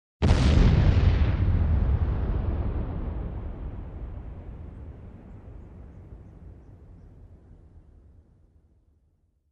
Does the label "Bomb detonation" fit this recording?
Yes